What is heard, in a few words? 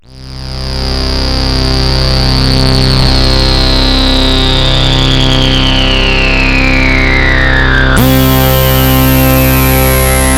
dirty laser saws